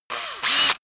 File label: screwdriver free; maschinen; schrauben drehen hydraulik